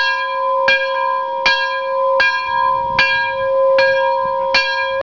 train bell; Cars-Trains-Planes-etc

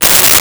Raspberry, Comedy Vocals; Vintage Cartoon; SONY PICTURES SOUND EFFECTS SERIES

